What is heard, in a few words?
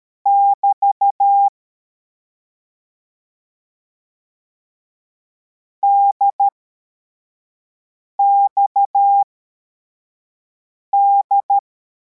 cw, morse